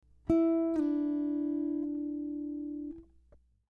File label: legato slide down, guitar; slides on guitar